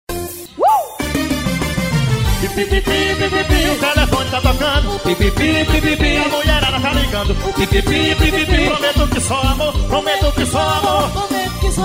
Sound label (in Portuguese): forro ao vivo; em brejo de areia